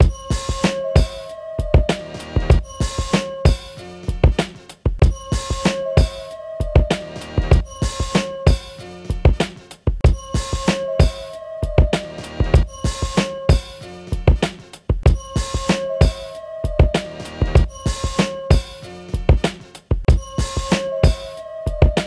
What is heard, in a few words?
intro beat